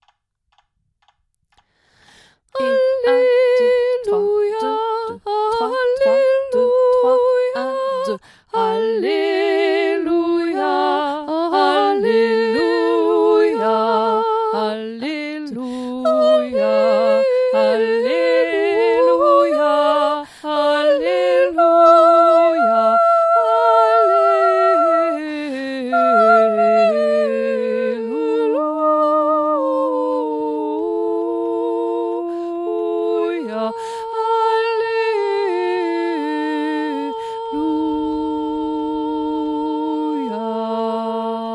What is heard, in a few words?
O Magnum Mysterium Alleluia Basse Soprano